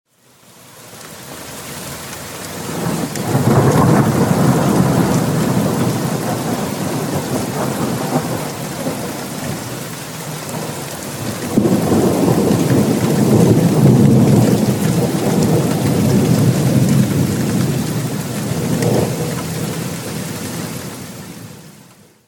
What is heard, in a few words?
great lightning and thunder; captured both on me phone